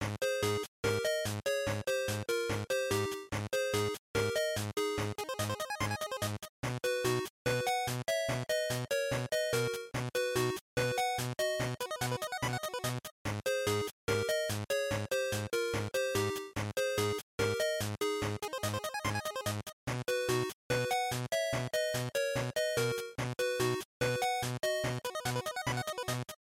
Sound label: Fighting Result Loop 145